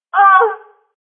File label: pain